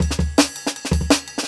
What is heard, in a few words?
Jungle Breaks; Original Jungle Breaks